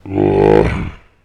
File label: Armor attack; gamerip; I Am Weapon: Revival